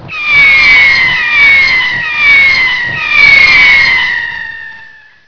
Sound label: whistles